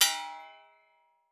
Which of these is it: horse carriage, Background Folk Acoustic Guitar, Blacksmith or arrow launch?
Blacksmith